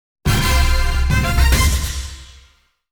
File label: Level up!; Lord of Fist